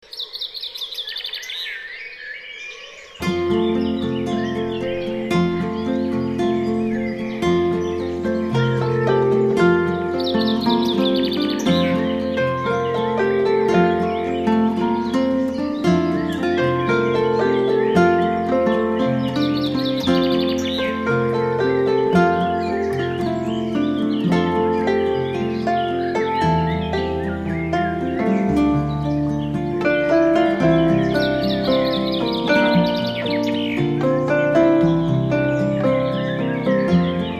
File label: Chaffinch